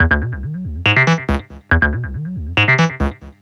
NRG Bass, Trance

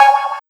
hit, big beat drumloops; USB Soundscan vol.25; AKAI; DJ 130

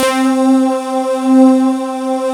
SIGH C4; Big Fish Audio; Synth City; COMPOSITE

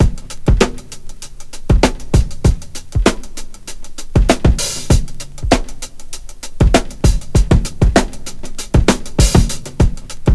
98 Tuff Hitter, Breaks; Lockdown Breaks

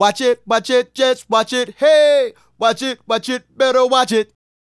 Male Solo, Hip-Hop and RnB Vocals

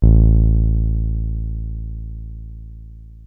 303 A1, TB 303; Hollywood Edge; Giorgio Moroder Rare Synthesizer Collection

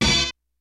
HIPHOP HORNZ; Kid Nepro - Elite

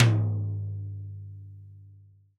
TD-20 Tom A, Roland TD-20 Toms

